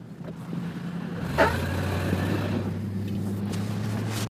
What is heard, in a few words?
big power loss chirp squeal noise; w211 amg